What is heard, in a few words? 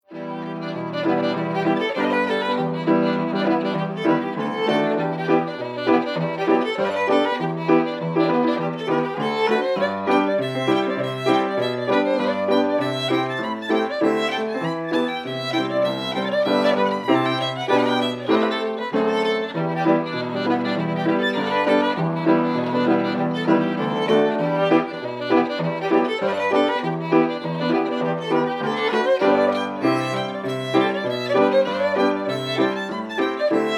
Seal Island Fiddle